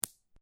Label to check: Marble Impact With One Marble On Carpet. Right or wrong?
Right